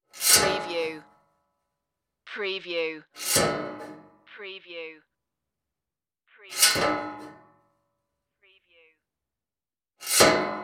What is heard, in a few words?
hatch slide shut